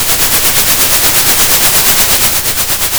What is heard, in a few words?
pop, Sound of a train